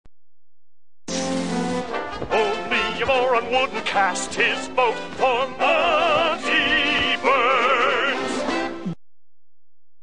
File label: jingle